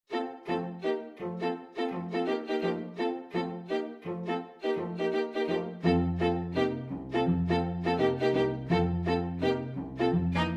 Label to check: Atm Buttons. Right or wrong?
Wrong